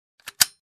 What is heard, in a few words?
Firearm cocking